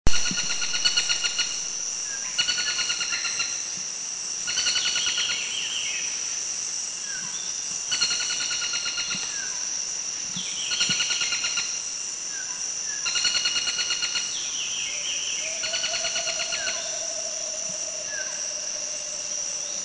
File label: Greater Flameback Bohol; Philippines